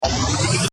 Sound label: multiplier up; popcap; bejeweled